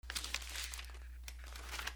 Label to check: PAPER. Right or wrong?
Right